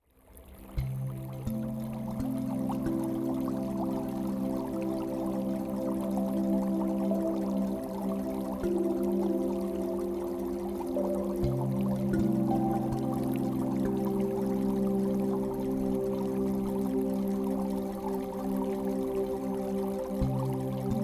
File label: Binaural Beats